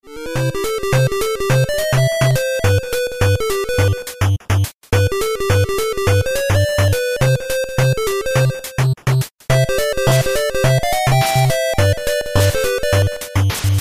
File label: 8bit